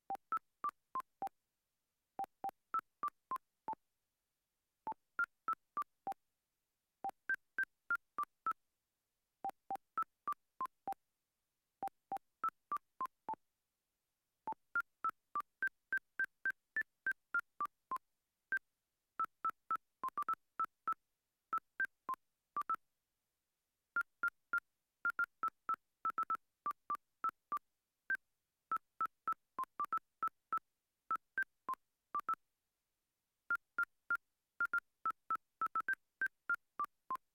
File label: piano test